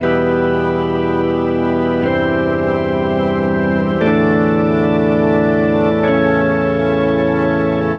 Chilly Organ

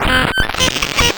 Distorted Reality; Spectrasonic; DIGITERROR

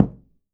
KOO KU M, KOREAN DRUMS; AKAI S6000 CD-ROM; WorldSounds

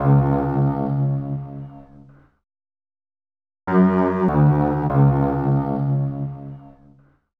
Deep String Bass; Sonic Foundry (Sony Creative Software); Club Joints and Street Anthems

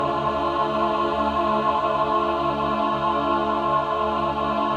min chord, Symphony of Voices

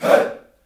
"HEY", Heart of Africa, shout; Spectrasonics; Heart of Africa 1; CH. SHOUT1

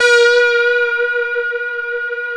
3100 C#5, Korg PS3100; Back In Time Records; Korg Universe VOL-1

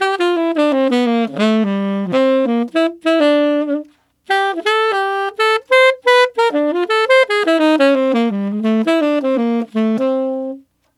Tenor Swing Shuffle in Cmin7, Tenor Sax Loops; Sonic Foundry (Sony Creative Software); Jazz Solos and Sections

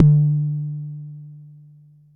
Bassdrum, Jomox Xbase09

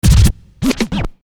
Scratch, Hip-Hop

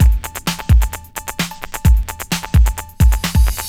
drum 130 electro, drumloops

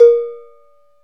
TUNED C, Cowbells; Roland L-CD701; PRC Latin 1

